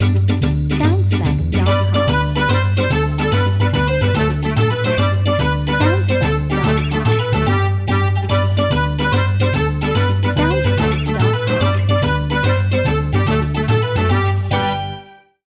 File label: Italian Tarantella Dance Short Version